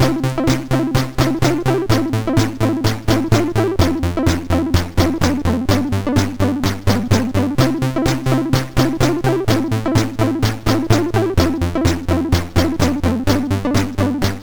Music Samples, MOS6581R4

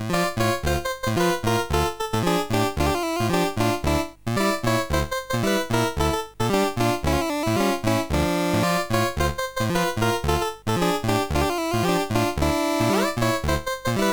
Habanera, CSG8580R5

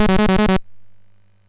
sid, MOS6581R4; Blueprint

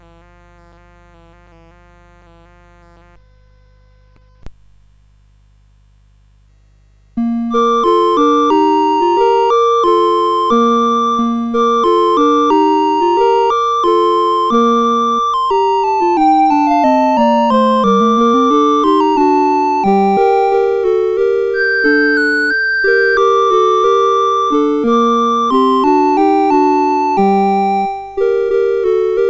CSG8580; CSG8580R5

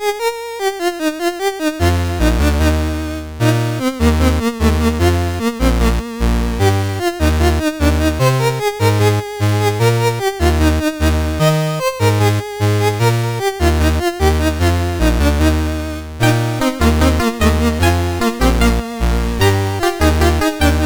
On a Branch in a Tree, CSG8580R5

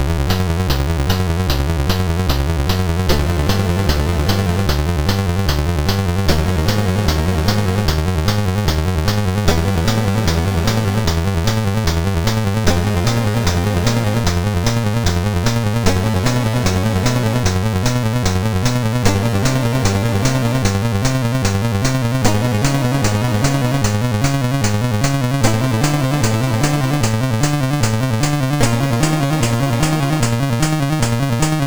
sid MOS6581R4; Escape from the Planet of the Robot Monsters

